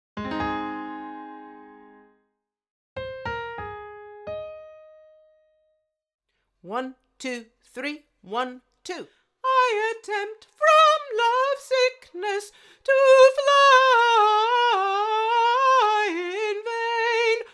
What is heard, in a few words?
Alto; I Attempt from Loves Sickness